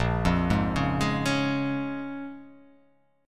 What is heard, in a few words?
Bb-Minor 7th, Bbm7 chord; Bbm7 arpeggiated